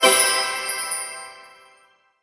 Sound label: deactivated; rainbow